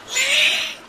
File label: dolphin hurt; minecraft; technic